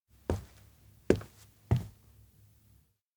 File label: steps indoor newhall walk; anemoi パッケージ版 1.1